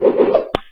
sling; wesnoth

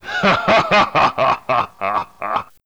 dwarf laugh; wesnoth

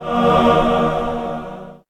magic holy; wesnoth

